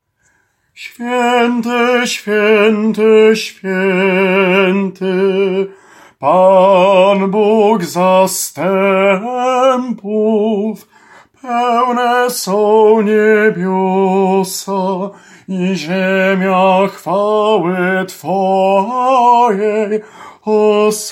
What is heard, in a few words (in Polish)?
TENOR; Swiety Swiety Kowalski